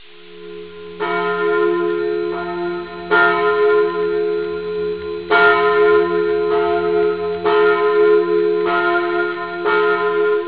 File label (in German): glocke, glockensound; pfarre